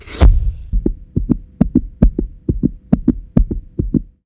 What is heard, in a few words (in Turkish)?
nefes nefese; cstrike